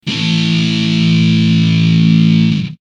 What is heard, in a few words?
a5 example, electric guitar